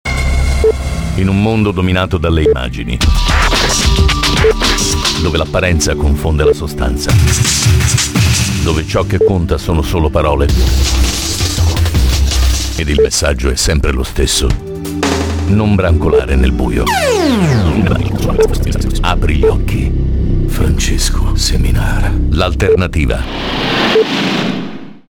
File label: trailer